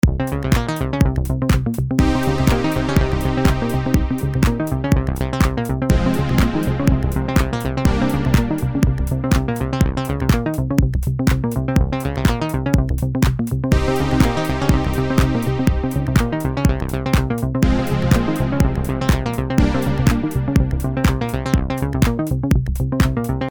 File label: Roland Juno106 the Mix